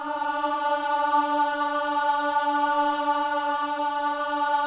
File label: BOYS AHS, Symphony of Voices